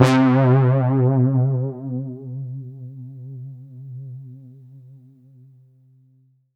C4, Synth Line; 90 s Sample CDs; NiceBeats; SynthLine VOL-23

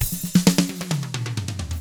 HRDRK, hard rock drums

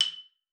F#6, xylophone; Xsample VOL-4

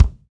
BD, Roland; Sound & Vision - Gigapack I

